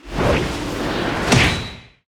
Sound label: attack; hero